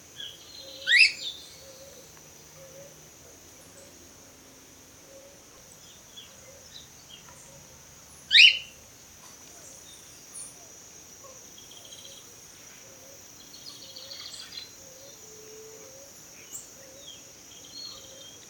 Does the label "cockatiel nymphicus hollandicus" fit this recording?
Yes